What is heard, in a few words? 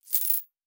Coins; Survival Game Sounds - Lite Edition; Objects and Items